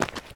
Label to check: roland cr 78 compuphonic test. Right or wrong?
Wrong